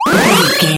retro powerup collect

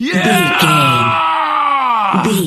voice male battle shout

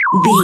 retro bird critter call squawk

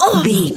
voice female hurt pain mild

